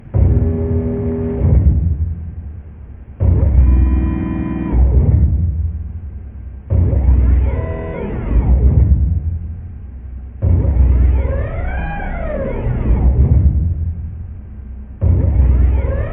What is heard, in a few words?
generic large machine